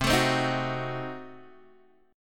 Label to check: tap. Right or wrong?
Wrong